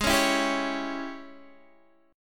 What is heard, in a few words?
Ab Major 7th Suspended 4th Sharp 5th, Guitar, Open D; x,11,11,10,10,11